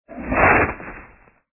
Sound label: spider up